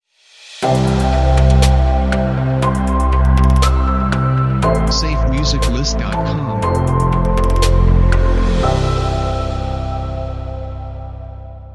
Calm Tech Ambient Audio Logo; SafeMusicList

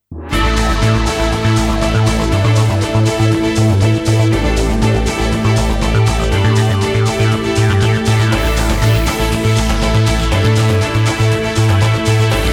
Latest News; SafeMusicList

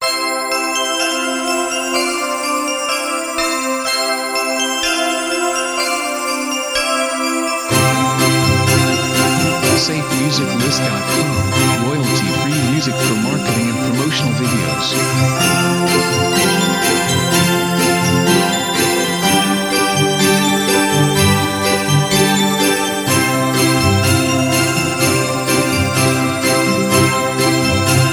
Christmas Bells; SafeMusicList

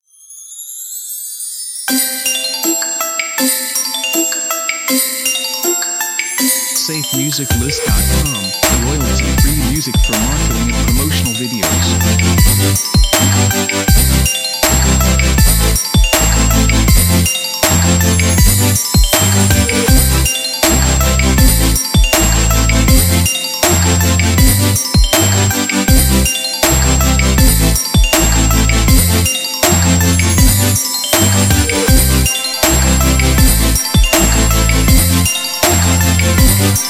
Future Bass Christmas; SafeMusicList

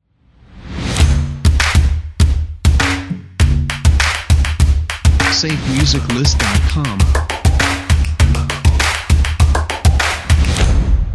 Urban Stomps And Claps Energetic Intro

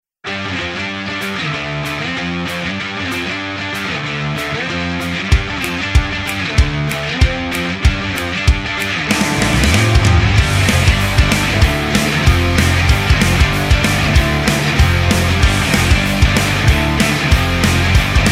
Driving Rock; SafeMusicList

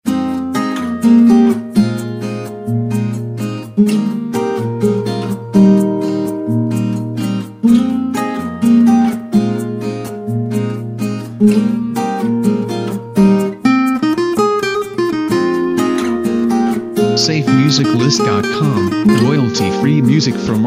Relaxing Bossa Nova

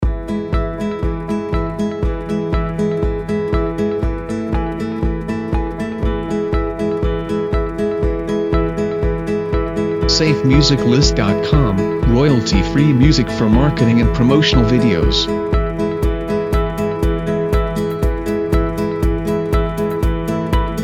Professional Inspiration; SafeMusicList

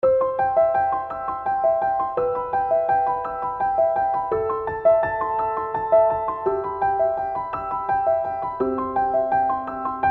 Uplifting Piano Melody

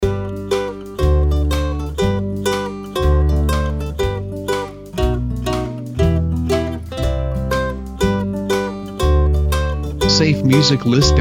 Happy Acoustic Guitar Loop; SafeMusicList

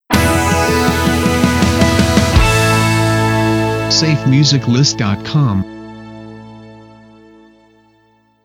Stinger

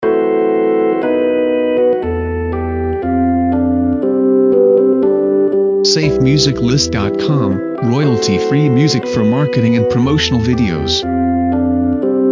The Wedding March Grand Church Organ

